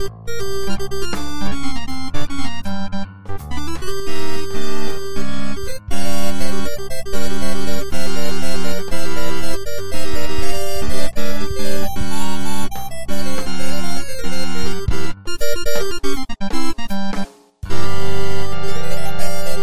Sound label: synth organ